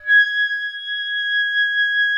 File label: OBOE1, G#6; Xsample VOL-6